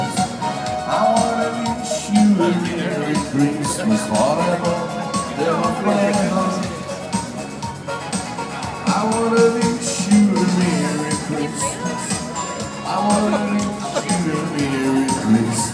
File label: bavarian longe lizard in full voice at the german market in birmingham